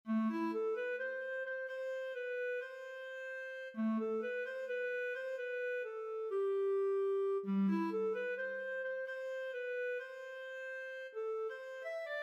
Clarinet Bb

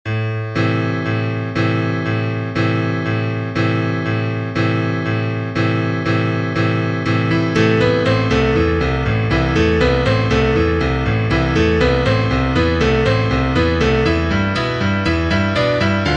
Bella Ciao Solo Piano

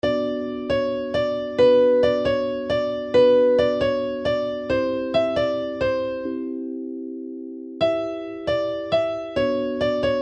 keyboard

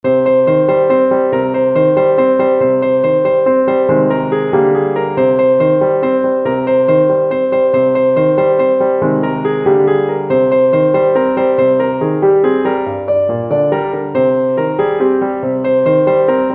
piano

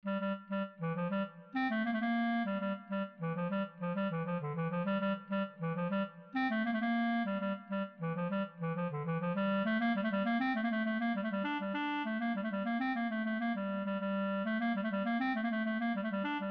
clarinet

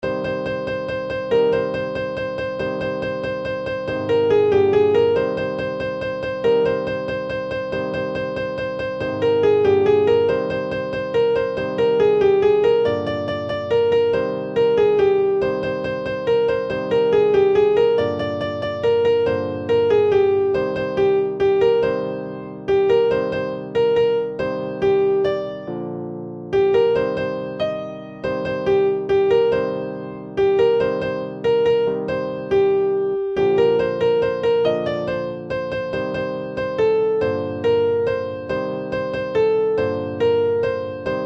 keyboard

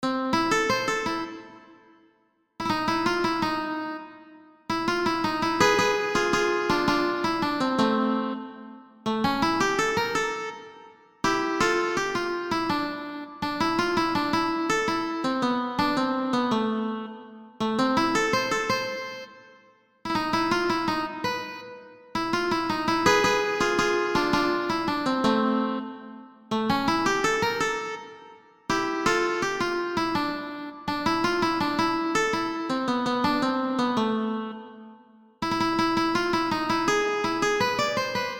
valse

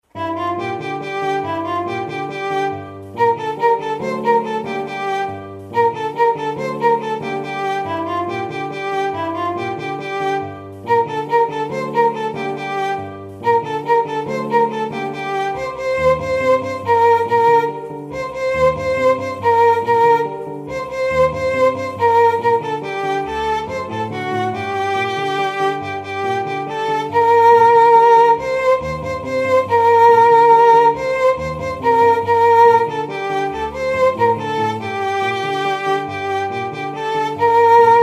violon

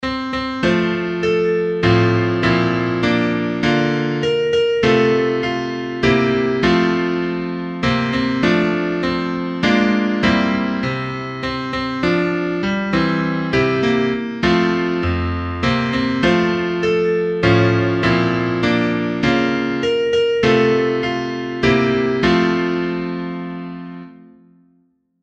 Happy Birthday to you

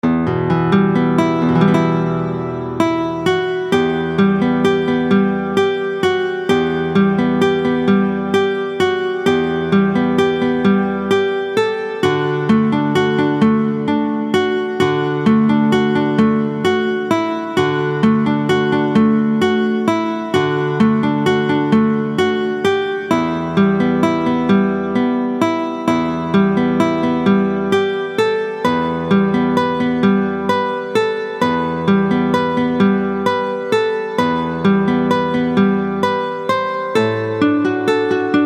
Melody

